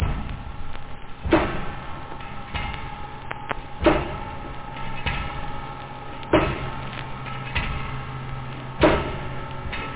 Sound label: swing, loop; da capo vol1